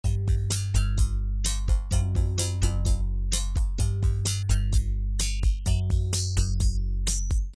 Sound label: regal buzz; effect